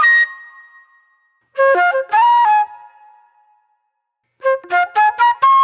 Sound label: Wild Flute